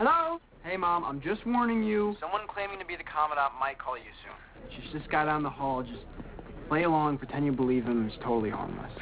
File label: phonecall